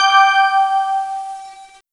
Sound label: got gem; MBHaxe